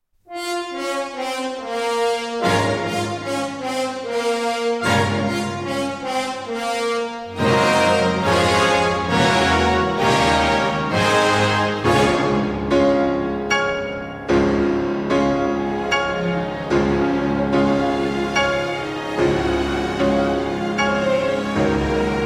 Piano Concerto in B-Flat Minor; No. 1; Op. 23, TH 55; Tchaikovsky

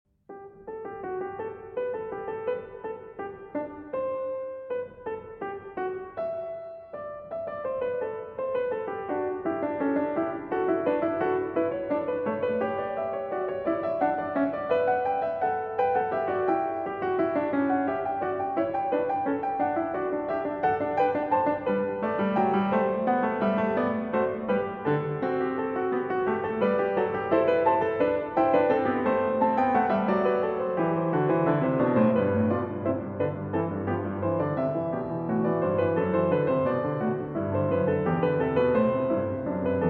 Fugue in G Major; Prelude & Fugue in G Major, BWV 860

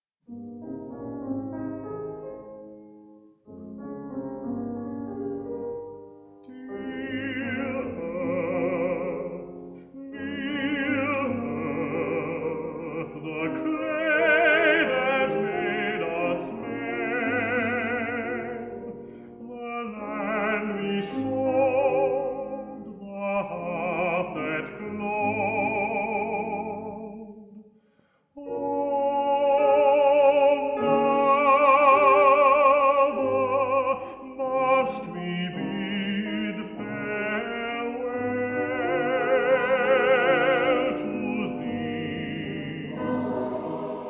Male Voice Choir; Climax Male Voice Choir; Songs of the Sea, Op.91

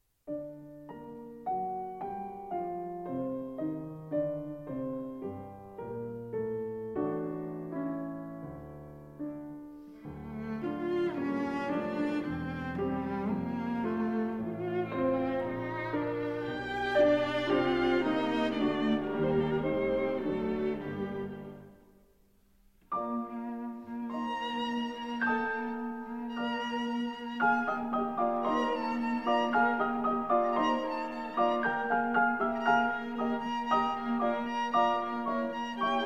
Piano Quartet in G Minor; No. 1; Op. 25; Brahms

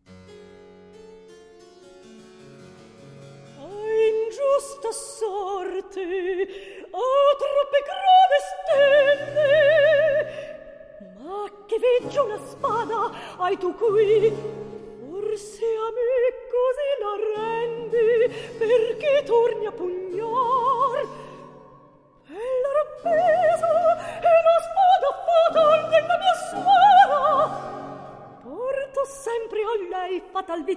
Recitativo; Orizia

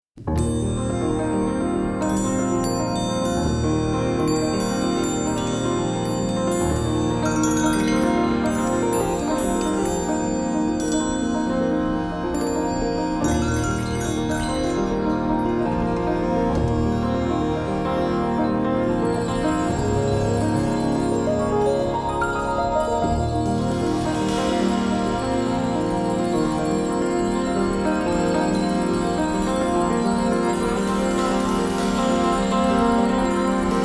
Bells

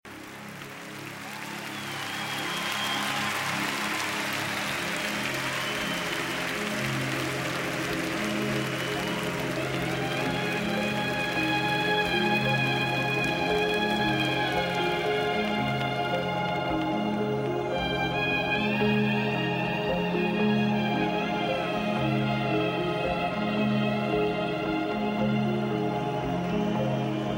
Slow Marimbas Live

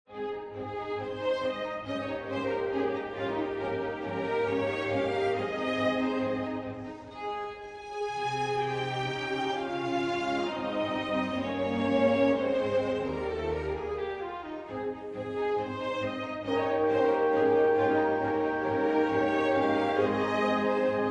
Piano Concerto in A Major, Allegro

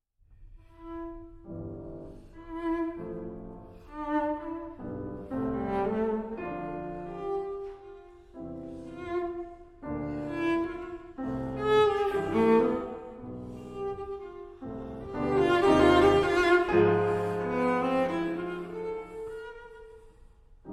Cello Sonata in C Major, Dialogo. Allegro, Live; Op. 65; Ed. Rostropovich

